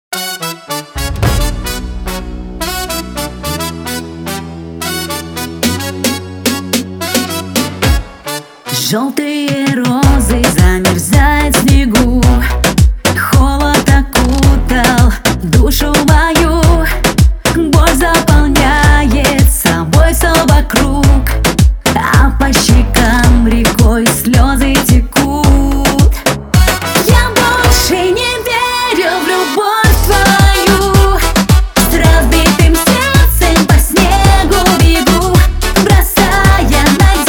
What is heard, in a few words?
Latino Remix